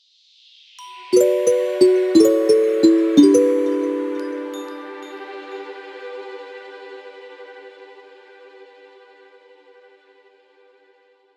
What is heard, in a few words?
game over; sea of stars ost